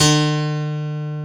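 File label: dance piano D2; Best Service Advanced Media Trax Modern Composer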